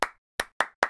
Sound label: tap dance hand clap